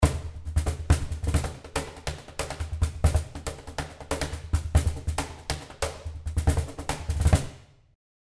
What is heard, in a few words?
Cajon Black Custom